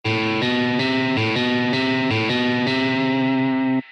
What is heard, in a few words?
rock riff guitar sms